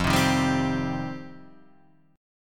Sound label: F 7th Suspended 2nd; F7sus2 Chord {1 3 1 0 1 3} strummed